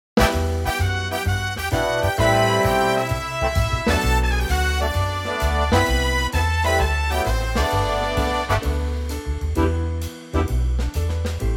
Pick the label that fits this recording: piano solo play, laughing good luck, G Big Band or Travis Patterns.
G Big Band